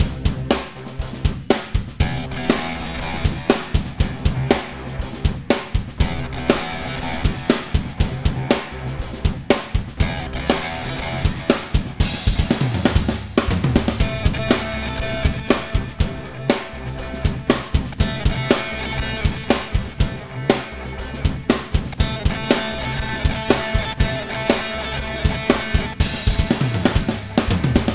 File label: Rock Loop